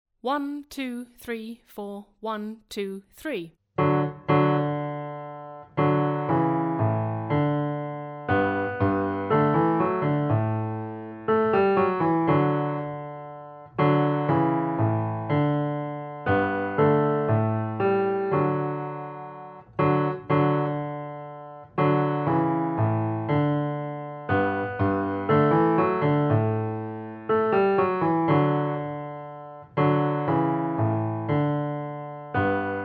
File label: Prelude; Playalong